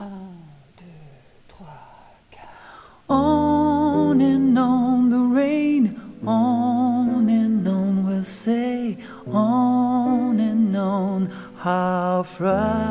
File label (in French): Alto chante sur Piano